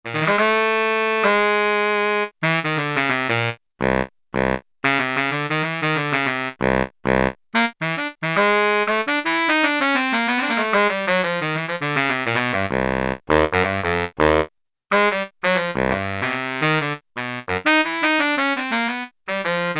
SAX Baritone Sax; Brass And Woodwinds; Roland L-CDX-03

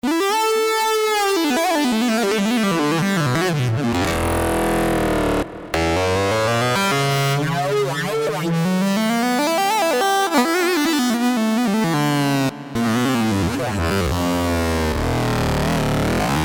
Feedback Lead, Roland JP-8000; Factory Performances

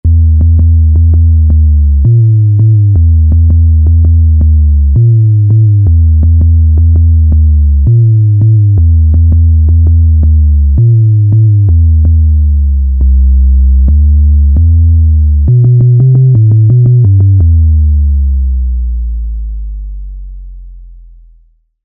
Jungle Sub

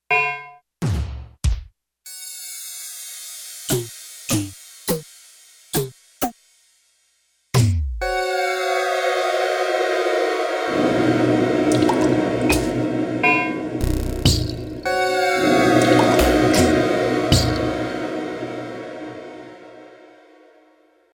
FX Menu

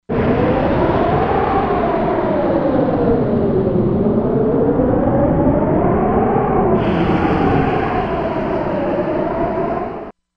Wind; Korg MSC-16S Environment

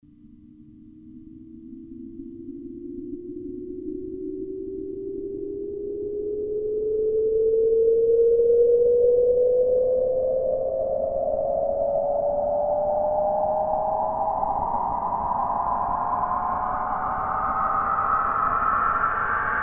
Jet Rise, Roland Alpha Juno-2; Factory 2

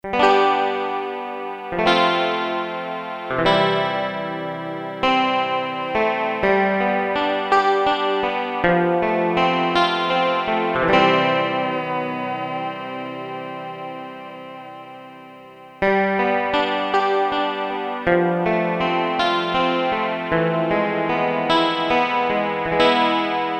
CHORUS, Electric Guitars; Korg DSS-1

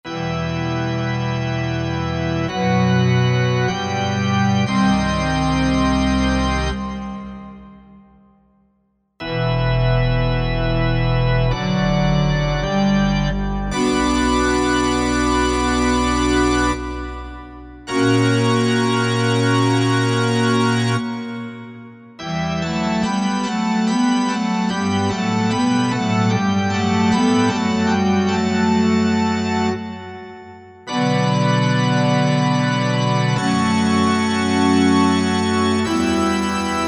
Pipe Organ, Korg MSC-9S Organ

